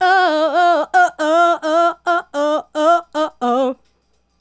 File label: Female Solo, Hip-Hop and RnB Vocals